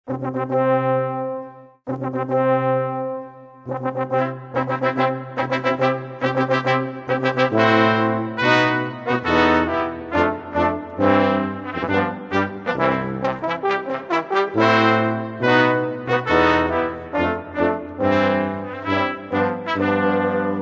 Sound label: brass wedding march